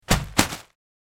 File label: Impacts On Bubble Wra; bubble wrap